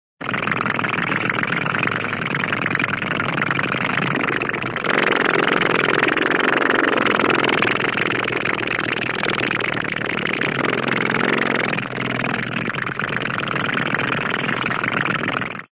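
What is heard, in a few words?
airplane, funny plane, in flight; cartoon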